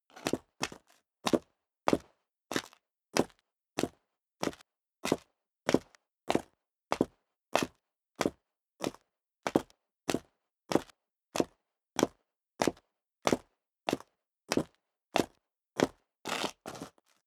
step high heels concrete grit downstairs sequence, footsteps; schritte; menschen